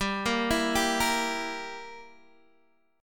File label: G Minor 6th, Guitar Open D